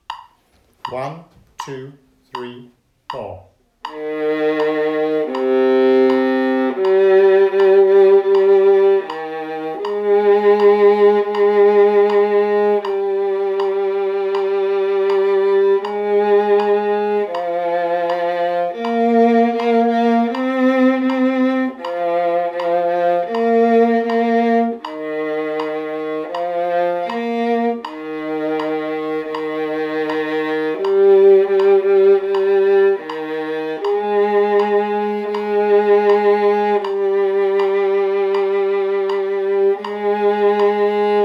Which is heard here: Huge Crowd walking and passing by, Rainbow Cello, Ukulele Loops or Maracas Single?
Rainbow Cello